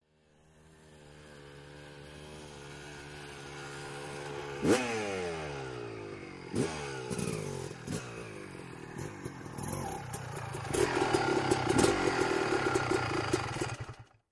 Motorcycle 250, motocross

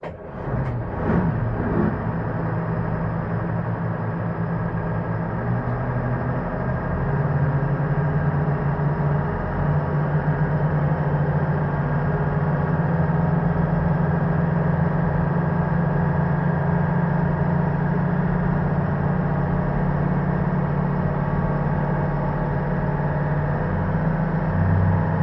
Military Pbr: Onboard Start-up, Maneuvers. Dual

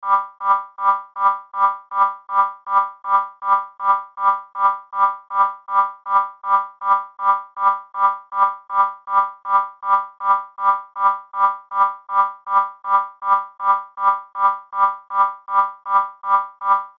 Alarm, drones & tones

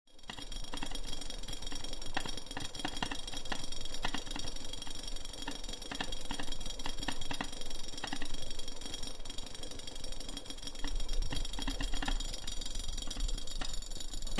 Boiling Water Metal, bubbles

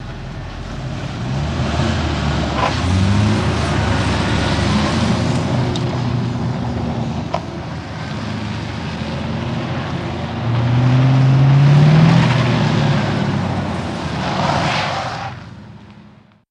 Cadillac U Turn Medium To Fast Then Stop, Asphalt

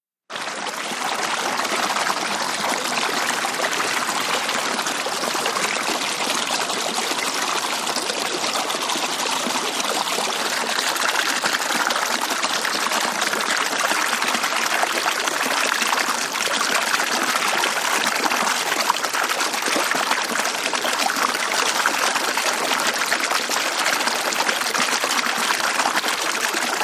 Brook Light CU Gurgl T, streams

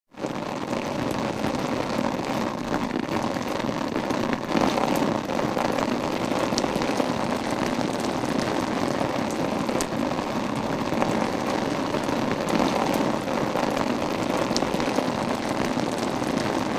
Rain Steady Hollow, raindrops, splatty

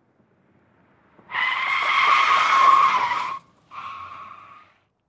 Sedan Accel Tire Skd

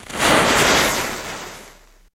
Sizzle Fuse Burn Ign

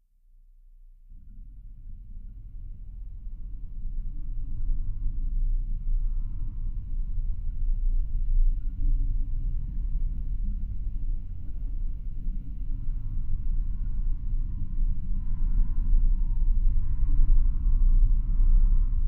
Underwater Ambience Metal- Ambient Metal Very Low

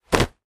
Impact Hard, rocks & dirt

